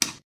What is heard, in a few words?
button click; Adventures in Lestoria; action RPG game; Sig Productions